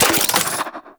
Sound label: Mech, Spear; Warframe; Tenno; Priest Spear; Scourge Prime